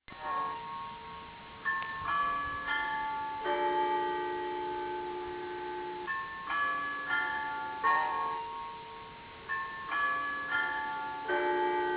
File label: bells